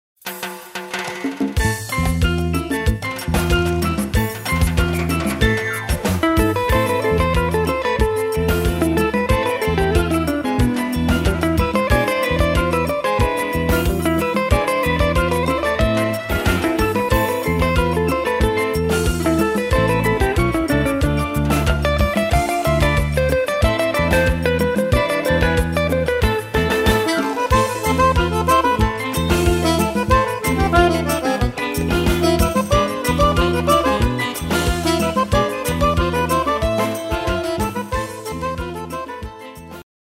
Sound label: Guitar